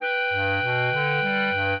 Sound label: clarinet; Mozart